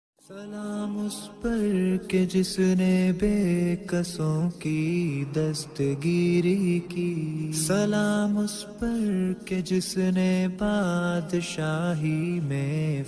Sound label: Islamic Naat Ringtone